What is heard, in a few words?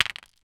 deep plastic snap firm, plastic